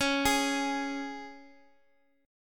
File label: Db 5th Db, down, Guitar Standard; arpeggiated; JGuitar